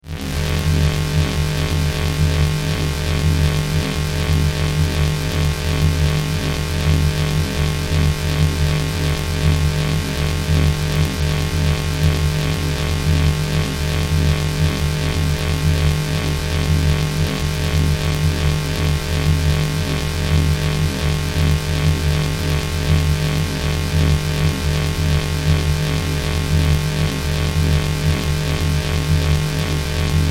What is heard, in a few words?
Force, Field, Energy, Source, Scifi; BlastWave